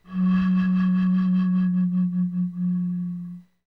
PRC BOTTLE, Bottle Blws

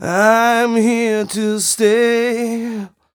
m 127 mel a, Vocals

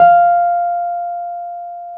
PNO Wurly F 6; Keyboards of The 60's and 70's

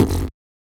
Beatbox; neuro; Sinistarr

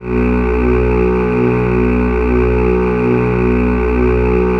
choir; Best Service ProSamples vol.55 - Retro Sampler; AKAI

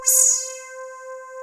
SEM LEAD C5, Analog; USB Soundscan vol.49; Analog Fantasies; AKAI; PROPH

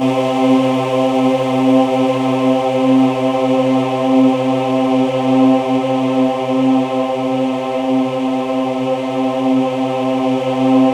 C4, Synth Line; 90 s Sample CDs; NiceBeats; SynthLine VOL-30

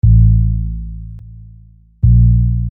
Sine Bass; Construction Kit; HIP-HOP; Sonic Foundry (Sony Creative Software)

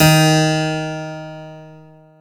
KEY HRPCR, Roland; Roland - LA Composer vol. 1

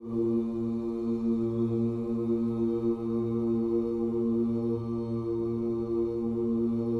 OOS, Gospel; Spectrasonics Vocal Planet

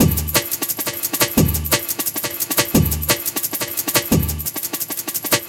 classic dnb breaks, D#, 175

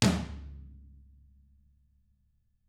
TAMA TOMR, flam; ILIO; Double Platinum Drums 1

